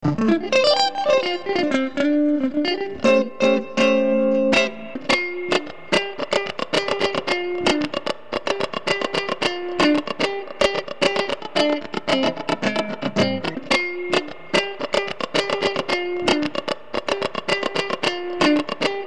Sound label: Funky Tone